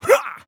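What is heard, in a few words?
voice punch grand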